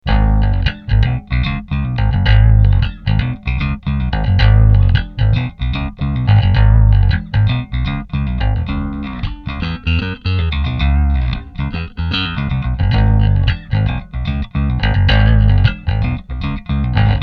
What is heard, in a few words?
riff